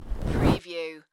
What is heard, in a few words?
Skiing, Snowboarding, Snowboard jump, snowboarder off jump